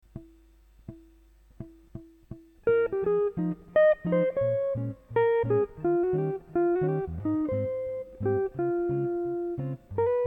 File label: improv exercise, guitar